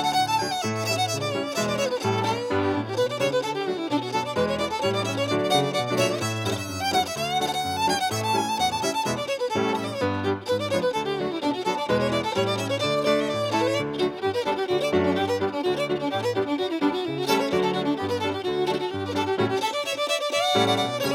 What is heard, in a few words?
Fishers Hornpipe Leather Britches Bill Cheatham